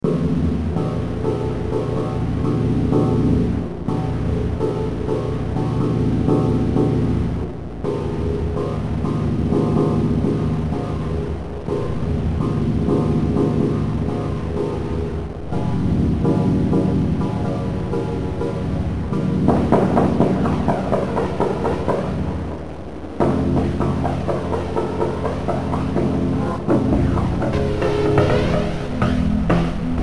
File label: Introspective Noise